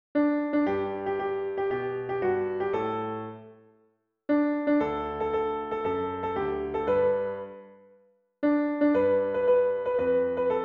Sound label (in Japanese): ピアノ